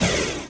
arrow; allods2d

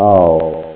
Hurt; Orb Mazez

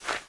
Maze Walk; Pirates Of Treasure Island

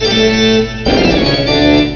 Tune; DoZ 1.0

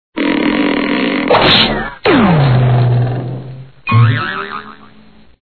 toons; 2004 Vesmírná Becherovka

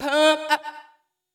VOICE GRL HOUSE 125, House Voice; eJay

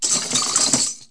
rummage, cartoon accents

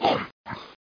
CHOMP, dogz; PC Gamer 34

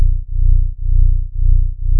bfg hum, weapons; Wanted

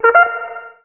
Web Invite; FireTalk

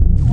FUEL PUMP; lander

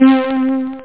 synth; da capo vol1; chords

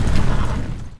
horse

